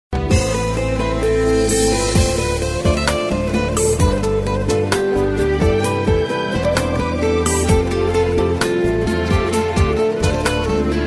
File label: rington mobile; زنگ موبایل ساده و شیک